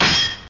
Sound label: ping; super trench attack